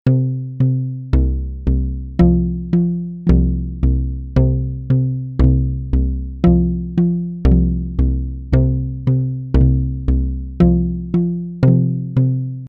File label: ethnic percs